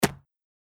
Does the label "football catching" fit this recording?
Yes